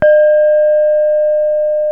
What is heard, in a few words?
gangsa mid, gamelan; gravityharps